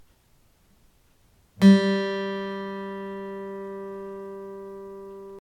3rd String G

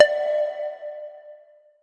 stereo pluck

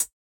hat

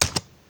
keyboard, -; pocket calculator 24

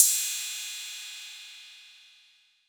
cymbal soft, 808; 808 aristocrat

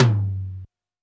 Tom, 707

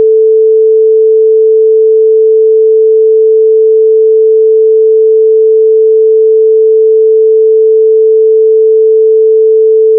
beep

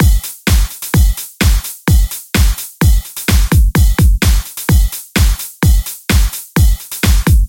hiphop drum loop

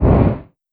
wizard fire woosh; mixkit

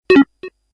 tones

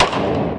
9mm Glock 17

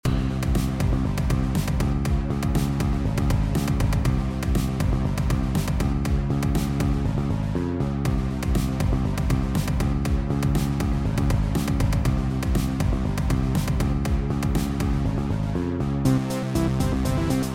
music medium level

